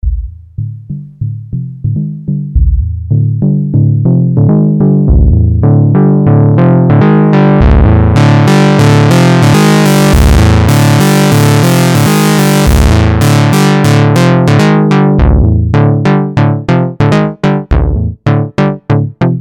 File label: Monark Bass Seq